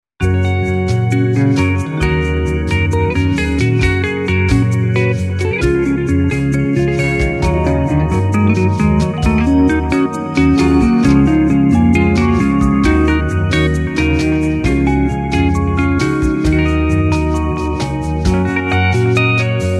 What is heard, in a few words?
SLIDE AND BRUSHES SUITE